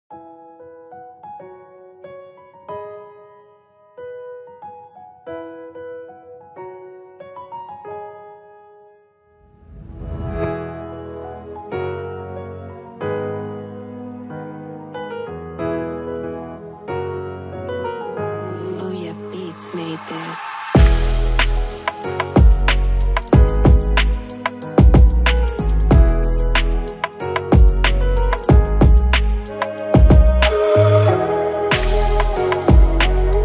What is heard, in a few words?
Oriental Trap beat x Balkan Hip Hop Instrumental